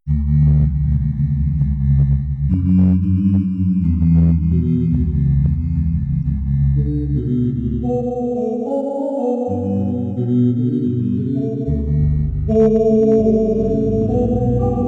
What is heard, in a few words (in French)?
canon orgue; kuzmanovic